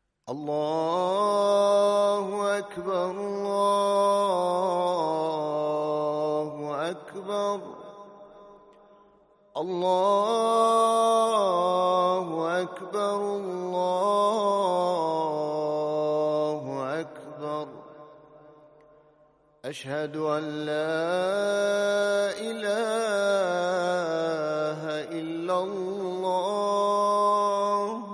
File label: azan